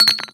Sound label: bottle, collide